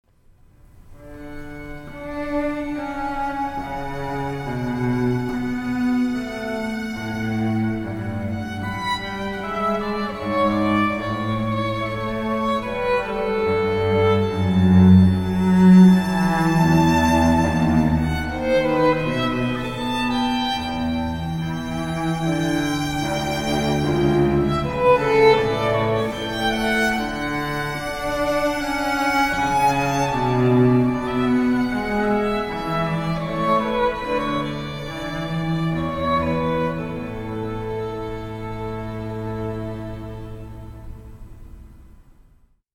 Air On The G String, Duo Violin Cello; Bach

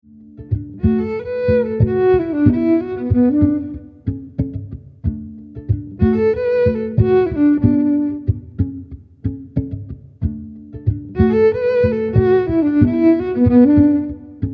Electric Violin

